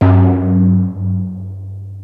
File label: SYN TIMBA; East West; Global Sounds; JP8